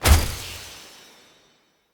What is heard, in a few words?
Bow Ranged Shot Charged; Weapons; Player; Bows; Grand Father Bow